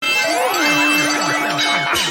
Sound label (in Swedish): det ballar ur ljudeffekt